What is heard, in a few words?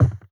Wood Foot; Zomboid 41.78.16; Project Zomboid